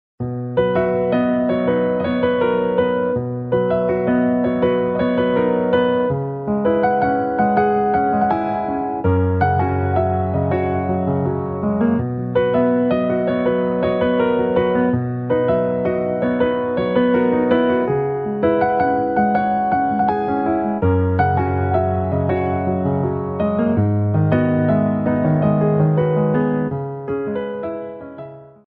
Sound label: instrumental ringtone; Vaaranam Aayiram; Tamil